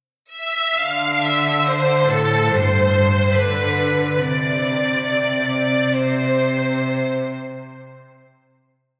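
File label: Strings Polyphony Bass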